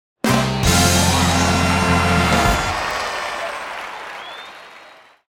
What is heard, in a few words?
carnival fanfare